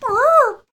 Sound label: ouch; dolls House